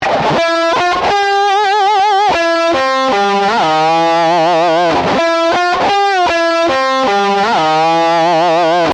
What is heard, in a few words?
Lick Variation, Guitar Solo